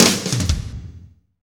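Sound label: slow shuffle, Roland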